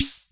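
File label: Hammond drum machine snare hi hat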